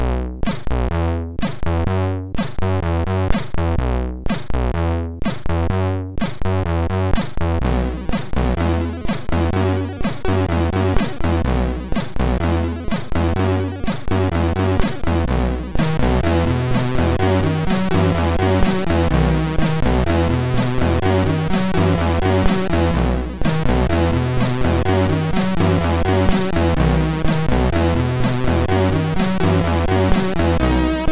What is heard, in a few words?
intro, MOS6581R3; Chaotic Country 04; hvsc